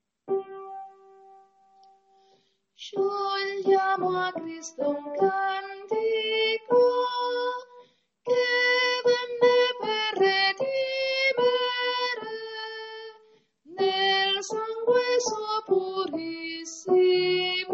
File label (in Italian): soprani